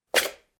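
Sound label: shuffling sound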